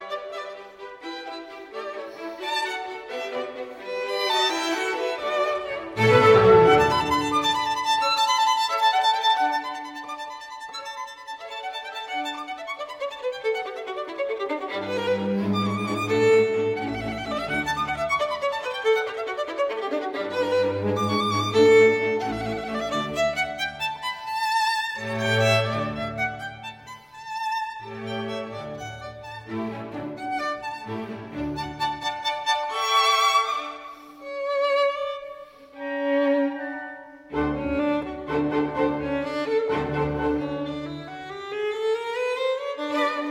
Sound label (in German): violinkonzert nr 3 g dur kv 216 rondeau allegro